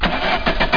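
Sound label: car start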